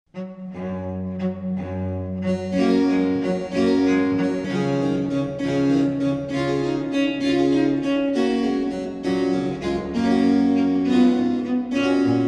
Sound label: pipa